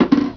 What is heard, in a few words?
drop; Gub2dem